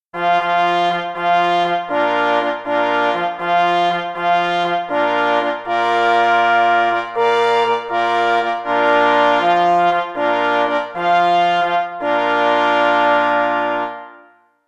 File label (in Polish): Hejnal, na 2 plesy i 2 parforsy; Hejnal KL Szarak w Gdansku